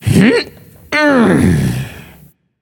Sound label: grunt lift, voicepack; smite; jorm